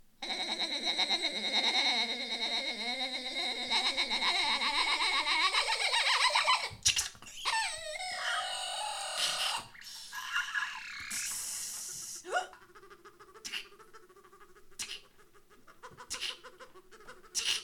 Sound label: improvisation